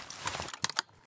rattle; tacz; RFP v1.0.0 beta2

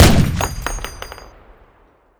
shoot, m2hb; tacz; RFP v1.0.0 beta2

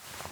cloth rub short; reapr; tacz; RFP v1.0.0 beta2

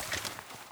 cloth rub; mg43; tacz; RFP v1.0.0 beta2

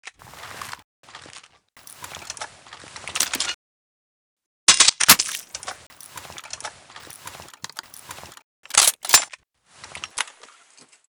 inspect empty, lwmmg; tacz; RFP v1.0.0 beta2

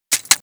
close cover; reapr; tacz; RFP v1.0.0 beta2

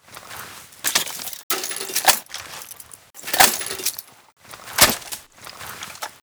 reload tactical; tacz; RFP v1.0.0 beta2

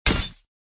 head hit; tacz; CIBR GunsPack v0.1

